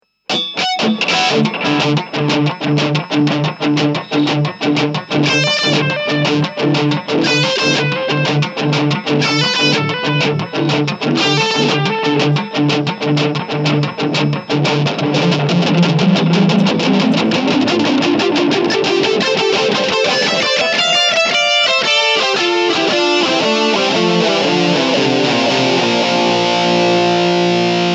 delay

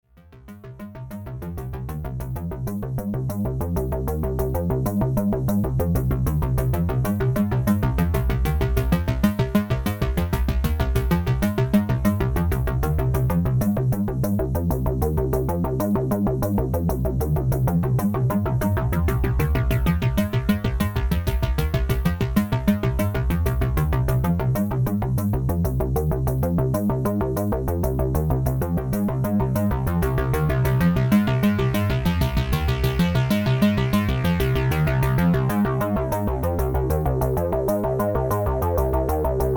Double Filter Sequence

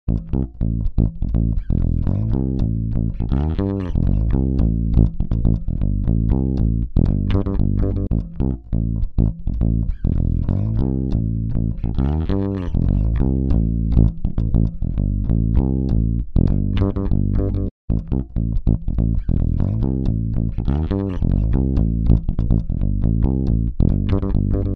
Fingered Bass

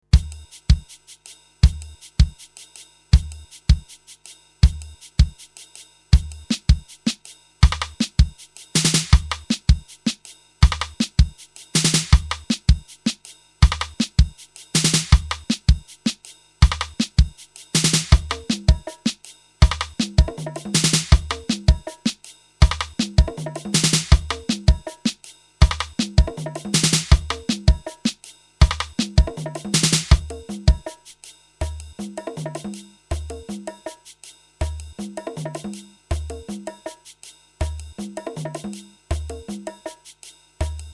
Drumstar 80 Uptempo

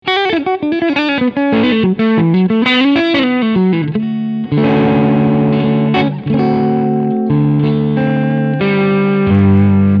Ch1 Crunch

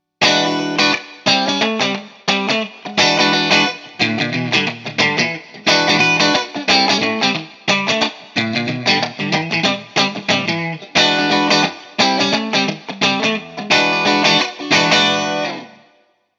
Hi Input Bass Shape; Artisan CH1

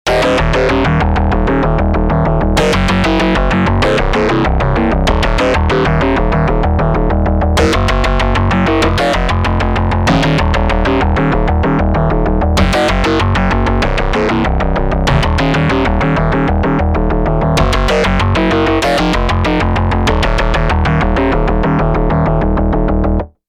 Elektron Digitone Bass Jam

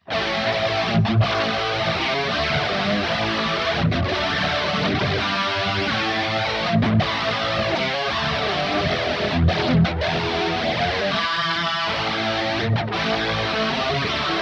Eventide Tricerachorus Highgain; Choral Bliss

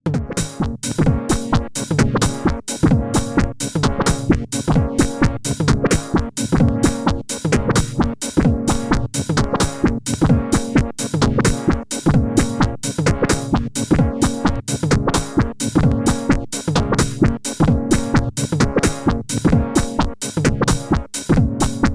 System 500

